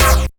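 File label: JUNGLE CHORD, RAVIN HITS; Spectrasonic Distorted Reality 1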